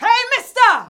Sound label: VOX VOX; Sound & Vision - Gigapack II CD 2